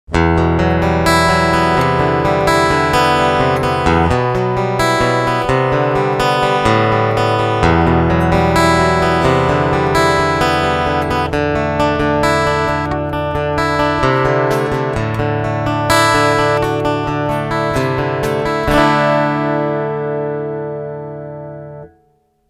Picking Full EQ

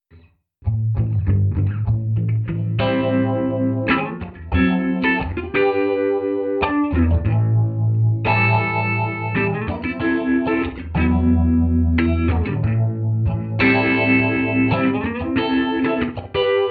shimmer

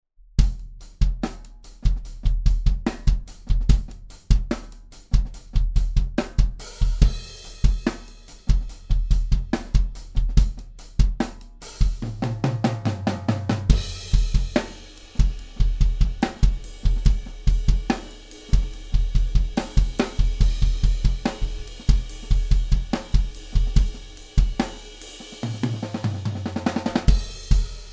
Clarett 4Pre off Air Drums

